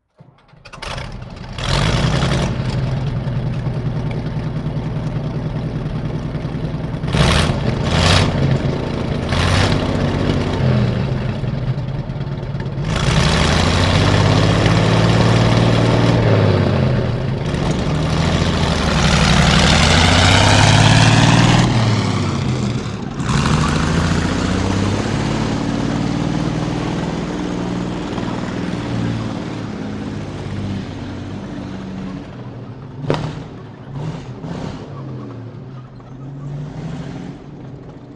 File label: panther ext start fast stop off; Sonniss GDC